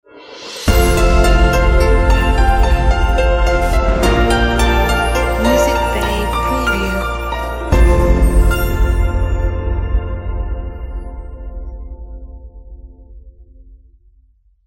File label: Elegant Piano Logo